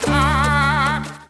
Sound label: Fly